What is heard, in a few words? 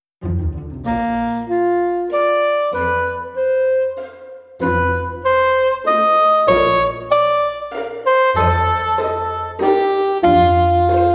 Heckelphone Single Instrument